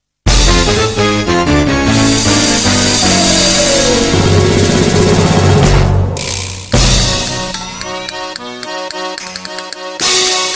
Music from the first part of Wonky Circus

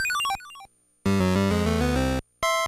Down; SMM; Wario

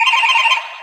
Horn; MK8; Baby Mario

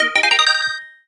Stylish Move

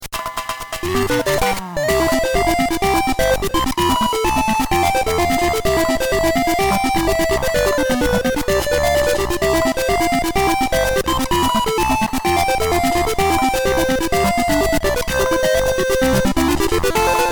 Mini-Game Theme

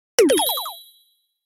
Item Stock Got Enable

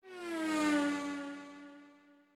Car Pass; Wario

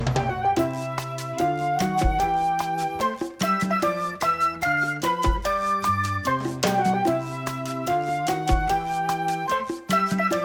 Ending Arrangement; SMB

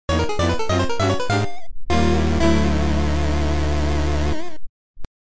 Game Over; Yoshi Topsy-Turvy

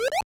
Stomp; SMBDX

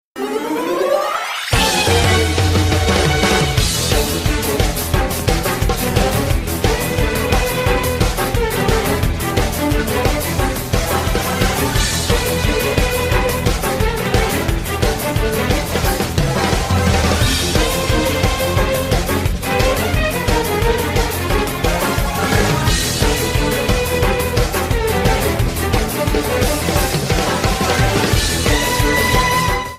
Fortress Boss

